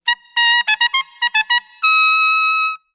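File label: piccolo trumpet toot; Musical Instruments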